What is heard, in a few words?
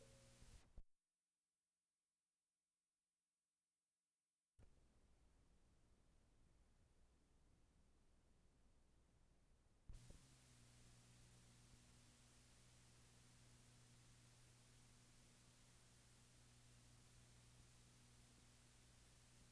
floor tom